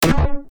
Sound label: Futuristic Gun Sound; FXHome; Bullet and Gun